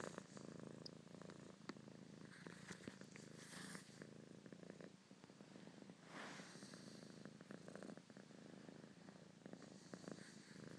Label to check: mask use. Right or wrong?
Wrong